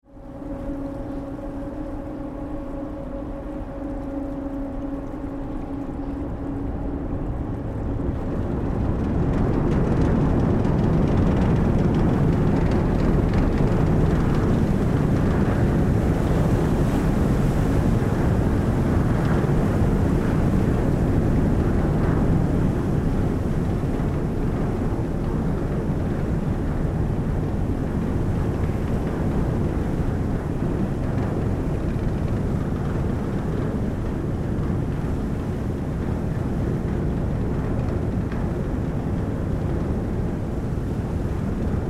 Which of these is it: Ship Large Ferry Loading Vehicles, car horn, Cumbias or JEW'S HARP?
Ship Large Ferry Loading Vehicles